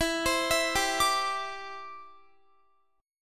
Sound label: E Minor 7th Sharp 5th, down, Guitar; Em7#5; arpeggiated